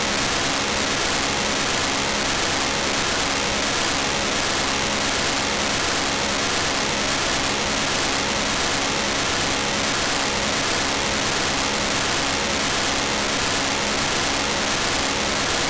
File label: iden channel